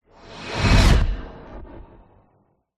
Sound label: sound design cinematic whoosh impact futuristic sci fi; zapsplat